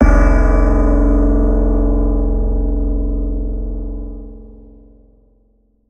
Ha Piano; Chasing Dead; Wii U

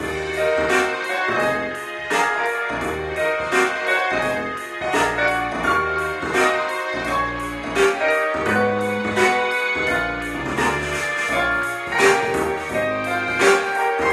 Mall Muzak; postal 2 gamerip 2003

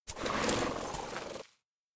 Queen Hide; We Are the Dwarves

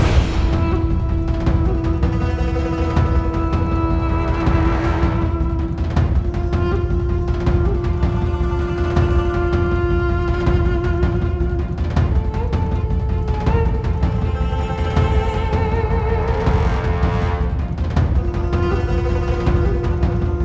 Fall Theme Loop; Gift of Parthax

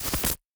Glitch; robobeat

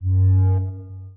Warning; bejeweled 2 deluxe

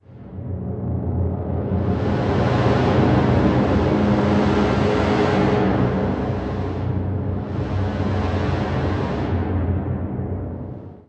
mel brass menacing; Inception The App

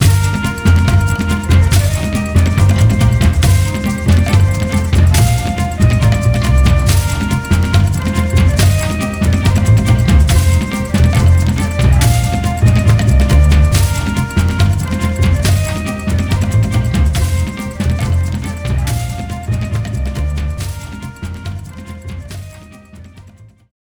Opponent Down; King Hippo

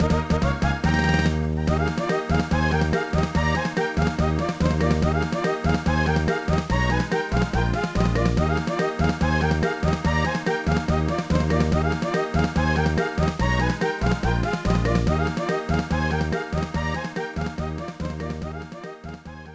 Victory!

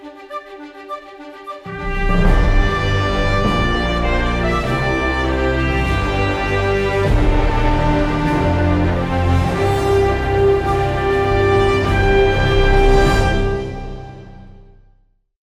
Mission Succeeding 100BPM; Mission Atlas

